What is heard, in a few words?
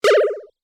8 Bit Retro Pulsating Boing Wobbly; Sound Response